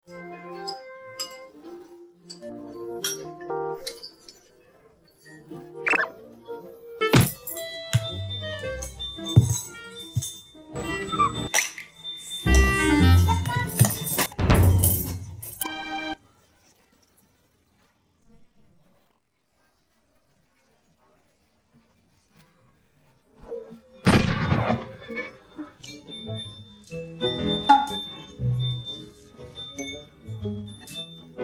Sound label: no vocals; 밥안먹어